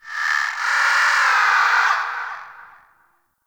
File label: Night Bird Screech; Warframe; Eidolon Plains; Ambience